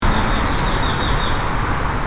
baumfalke; sattnitz

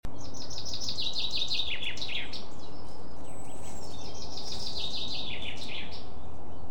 buchfink, rufe vögel; voegel

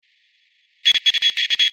kleine hufeisennase; Fledermaus

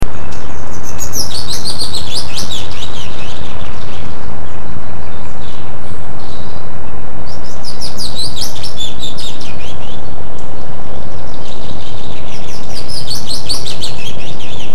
fitis

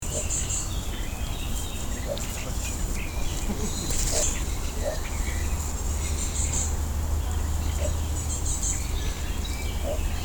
zwergdommel; schilf

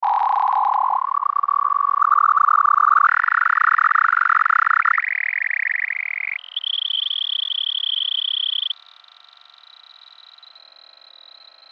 kleine hufeisennase, fledermausrufe; fledermäuse rufe

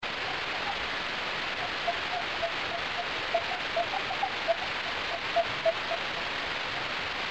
großer abendsegler; Säugetiere; Fledermäuse - Rufe transponiert

